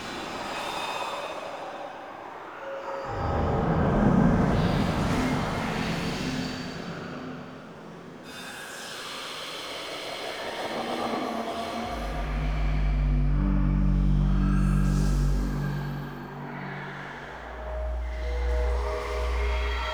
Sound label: Interior Bed Loop, Ambience; Nineteen Ninety Nine